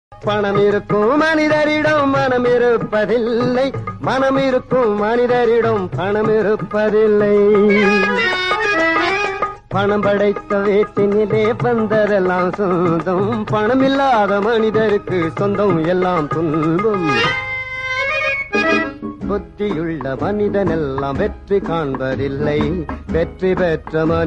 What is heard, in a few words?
old tamil songs